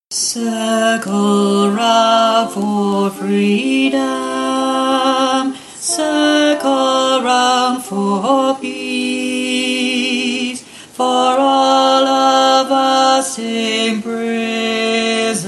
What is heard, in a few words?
Circle Chant baritone